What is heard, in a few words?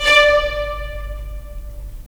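strings violins, left; Roland - LA Composer vol. 4; 90 s Sample CDs; Violins Sh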